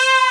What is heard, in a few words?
trumpet